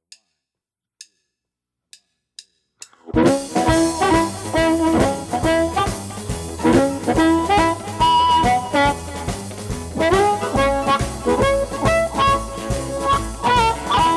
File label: fender super champ x2; amp reviews